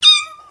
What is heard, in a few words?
Kitten Meow; creatures organic